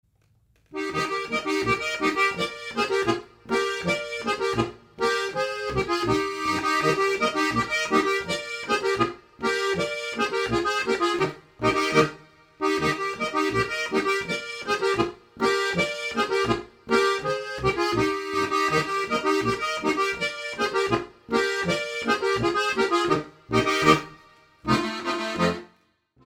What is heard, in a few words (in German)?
Harfen Boarischer